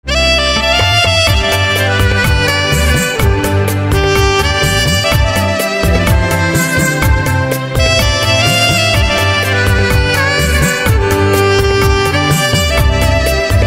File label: Saxophone